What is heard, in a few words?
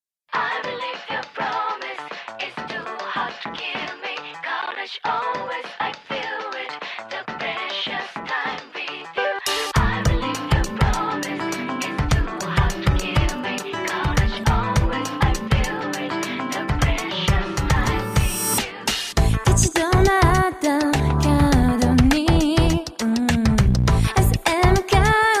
chant pop jap